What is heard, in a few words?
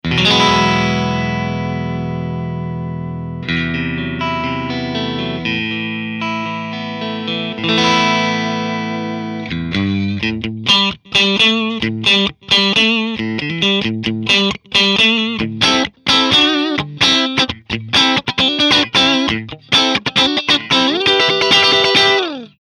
Fender black strato